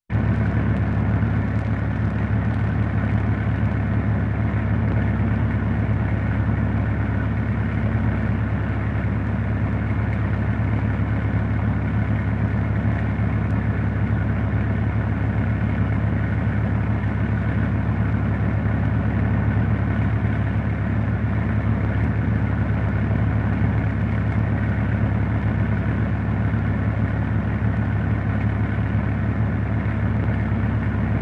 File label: Tractor Engine Noise Short